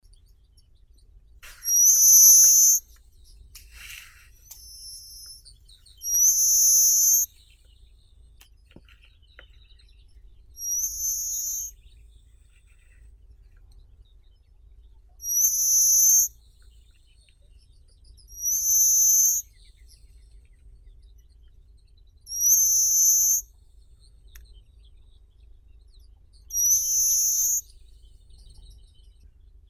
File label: vorobei korotkopalii carpospiza brachydactyla